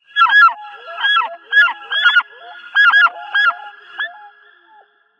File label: Plant Ext Rnd Lake Birds; moul